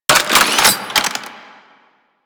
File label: Anti Air Gun Equip Melee; DE; Warframe; Weapons; Archwing; Arch Anti Aircraft Gun; Kahl Grattler